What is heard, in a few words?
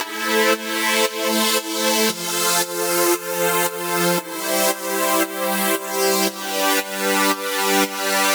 115 A Synth Modern Run; Zero-G; Future Wave